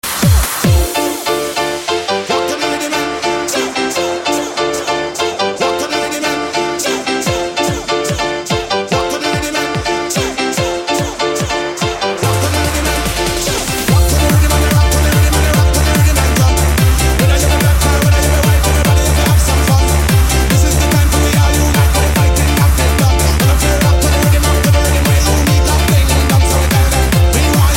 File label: Dancemusic